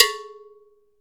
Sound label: LOW CUP GO, African perc; AKAI S6000 CD-ROM - WorldSounds